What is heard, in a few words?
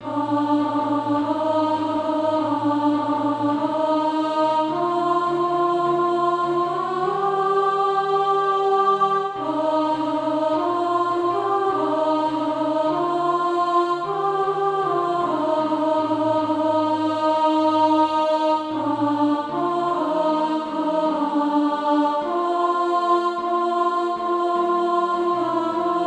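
alt, Practise Music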